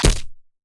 shotgun

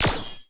staff cannon

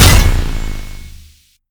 sword

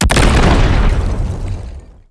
black cannon full

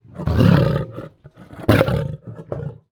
lion low roar

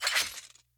snake knife end

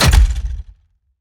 riot shield

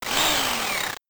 rush 8bit

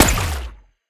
water ball

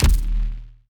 ui back

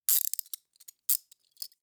handcuff

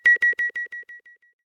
sidewalk light green

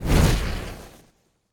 aoe flamethrower pre

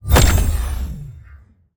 ui characterupgrade

